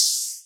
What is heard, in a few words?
Open Hat, drill; Drill Drum Kit; Drum One Shots; Cymatics; PARADOX II